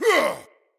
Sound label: attack grunt; smite; odin